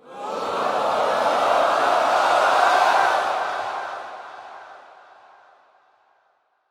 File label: Crowd Excitement Start Up; Lunaro